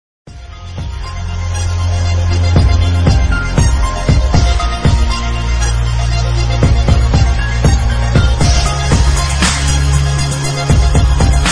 rnb beat instrumental

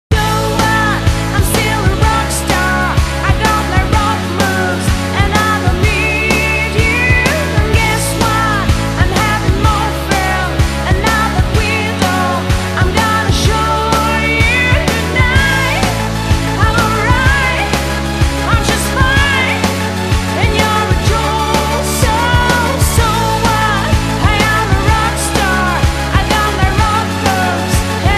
karaoke; rock

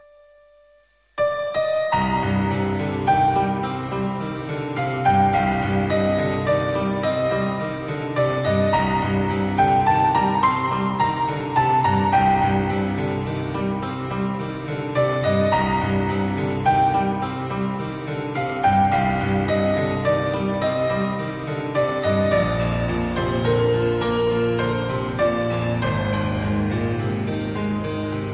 piano; parasite eve

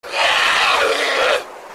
ZOMBIE

